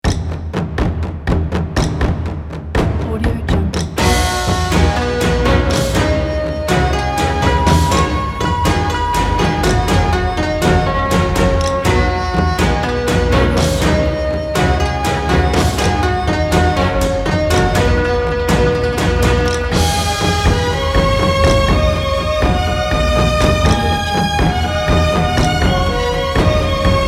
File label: chinese lantern festival